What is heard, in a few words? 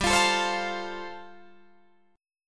G 9th Suspended 4th, Guitar Drop D